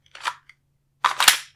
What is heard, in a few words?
assault rifle reload